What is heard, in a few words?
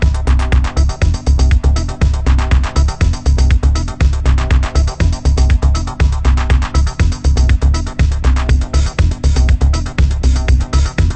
disco